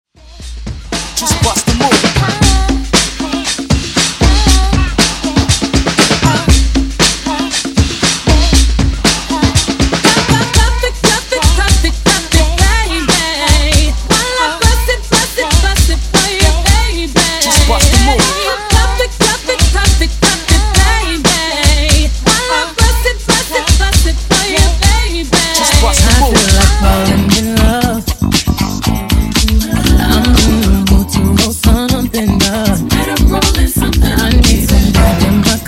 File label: Funk MashUp Blend 118Bpm